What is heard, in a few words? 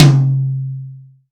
acoustic tom drum c sharp key